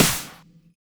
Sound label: Snare; VEC1; Club